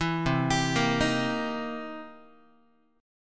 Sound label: C 7th Sharp 9th, C, down, Guitar; arpeggiated; JGuitar